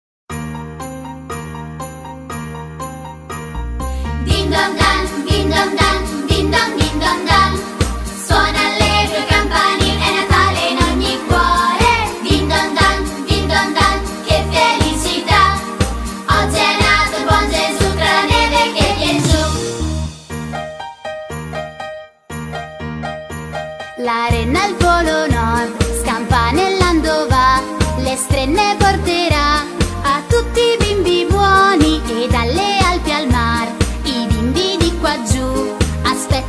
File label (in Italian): Jingle bells versione italiana Canzoni natalizie con testo